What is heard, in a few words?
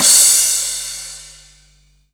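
cymbal, dance drums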